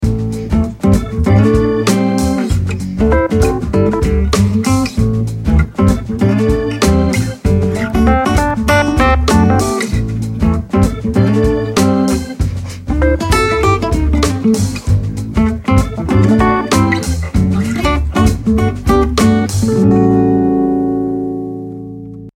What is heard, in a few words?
Cafe groove, Ringtones; Alcatel Vodafone Smart II